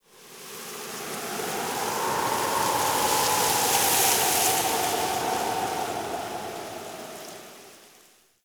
Night Wind Gust Medium; Ambience; Warframe; Eidolon Plains

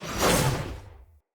Knight Axe Whoosh Heavy; Weapons; Enemy; Axes; Snc Knight Axe

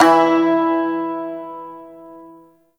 Shawzin Oct One E; Warframe

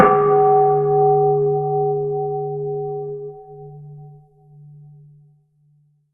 Bell; Warframe; Dojo Props; Bells

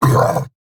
Pain, Battle Barks; Warframe; Eidolon Grineer

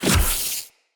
Soul Ability Removed

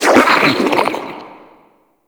Grineer Frogman Pain, Vocals; Warframe

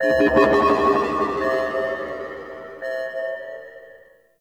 Ship Exterior Satellites; Warframe; Corpus Ship Remaster

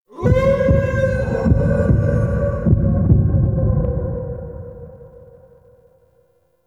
Title Card Add Scared; Duviri

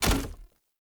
Short Sword Medium Impact Wood Hollow Large; Player; Environment

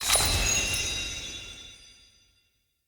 UI Confirm Reward; Codex

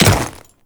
Ice Step; Enemies; Footsteps; Warframe; Grineer Royal Guard

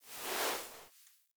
Foley Idle Sit; Warframe; Kubrow

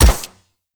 Shot Mech, Weapons; Akarius Prime

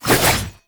Staff Swing Spin Sweetener; Warframe

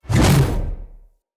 Spear Whoosh; Weapons; Enemy; Spears; NimrodSpear

